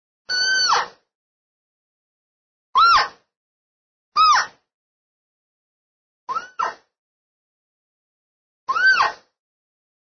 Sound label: cow, elk; elk 2001